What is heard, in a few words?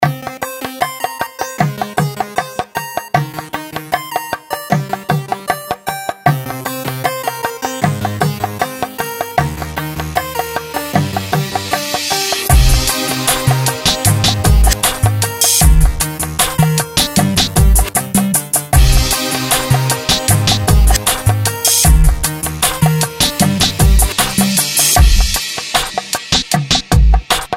Hip Hop